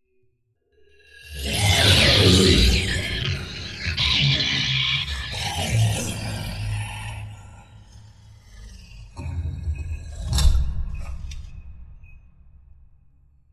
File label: Growl Snap In A Lair